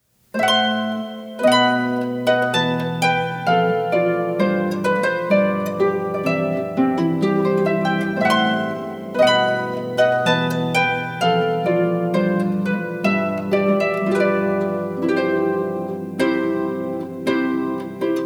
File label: WEDDING MARCH